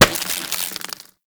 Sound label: Devour Enemy Bite; Warframe; Mummy